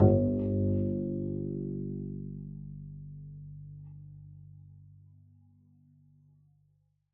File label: pizz g1, double bass; dsmolken